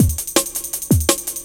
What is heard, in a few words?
Step Off Cut, breaks